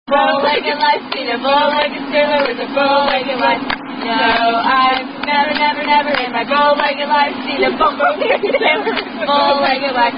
Bowlegged Clapping Game, A Bowlegged Sailor Bowlegged Wife Clapping Rhyme